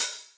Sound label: puncture, Hats Electro Open